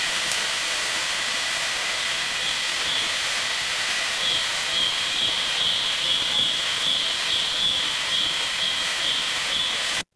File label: ambience rain